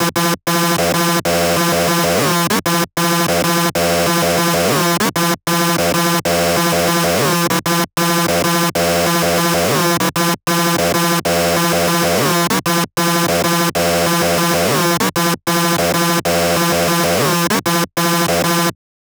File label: synth